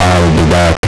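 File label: TERMINATE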